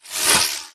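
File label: Drone Movement Turn; Titanfall 2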